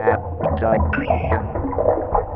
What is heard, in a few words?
Abduction Vox; Ambient Cinematic; Vox Loops